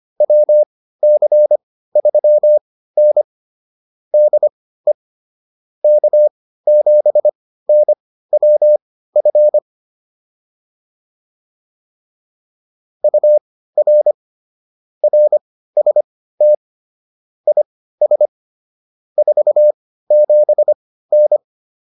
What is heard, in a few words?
QSO, intermediate practice files